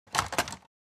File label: Telephone set down